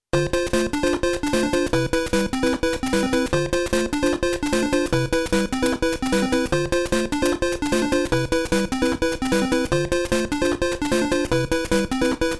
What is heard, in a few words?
Invincible BGM; Super Mario World